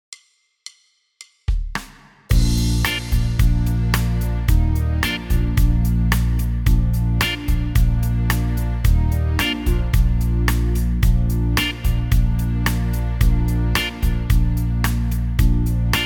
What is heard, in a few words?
Bb, Boy Band Ballad; genos houseband